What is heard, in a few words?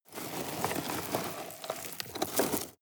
sweep small rocks soft